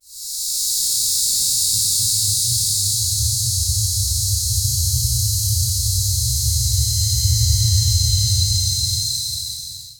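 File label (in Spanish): susurros volcanicos; susurros volcnicos y ca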